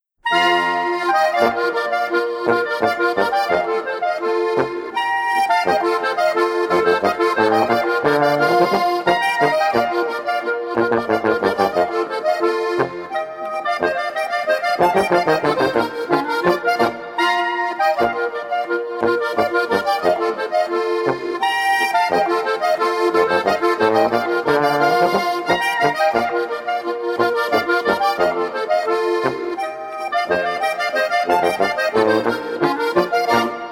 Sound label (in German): Walzer